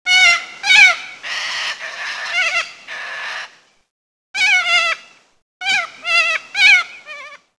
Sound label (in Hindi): kakatua; pakshi